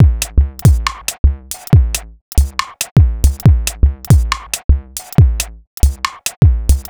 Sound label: Bpm139 Bad Ratio Break, Dubstep Drum Loops